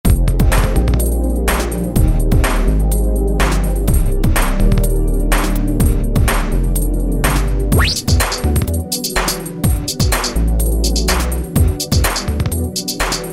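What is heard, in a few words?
Electro beats; E-mu PK-6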